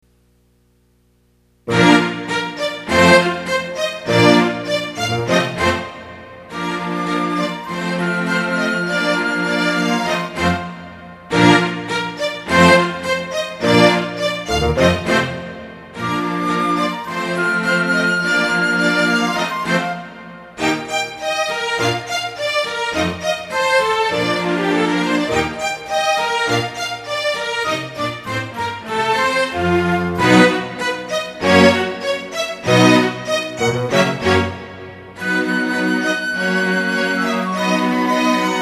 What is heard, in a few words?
music minuettish